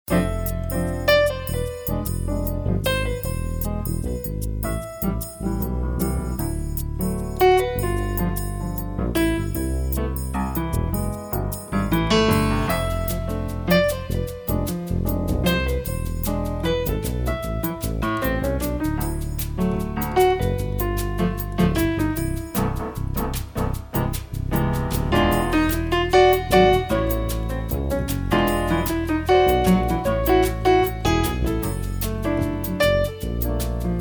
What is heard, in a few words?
Samba